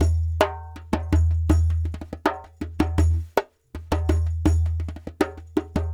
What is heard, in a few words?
Lg Dumbek